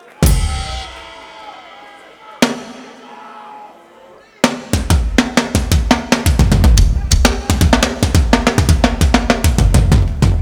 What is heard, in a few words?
DRUM SOLO